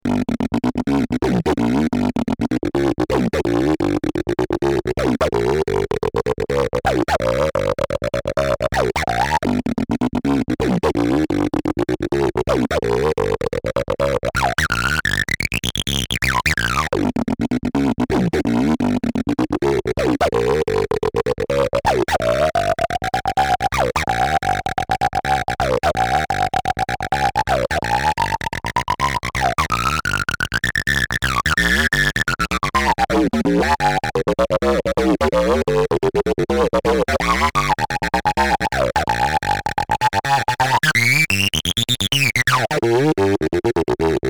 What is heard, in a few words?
acidbox chorus in the dark